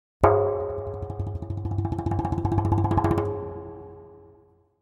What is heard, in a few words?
Drums Percussion Danger - Fast Drumming On A Thin Metal Percussion; Hollywood Edge; sound designer's toolkit v2